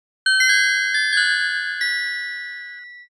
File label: wind chime far